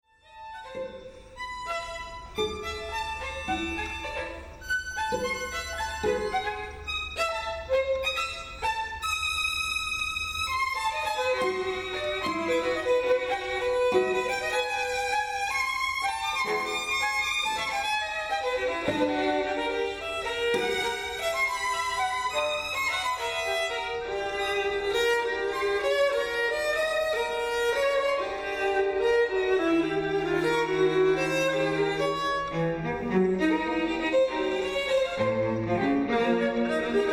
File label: String Quartet